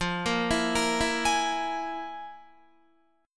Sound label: F Minor Major 7th Double Flat 5th, Guitar; FmM7bb5; arpeggiated; 13,13,14,15,16,15